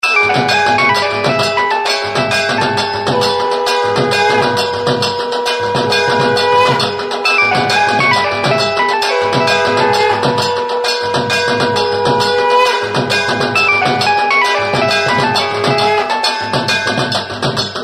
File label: Nokia Dhak